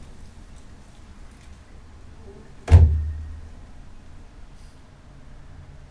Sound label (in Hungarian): becsapódó mecsetajtó